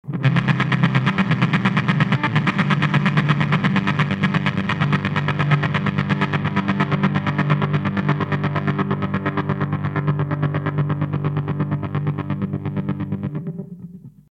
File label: FUZZ DEVIL&TOREMOLO TURTLE FULL; 教科書には「過激なマシンガンサウンド」と / 記載されていましたが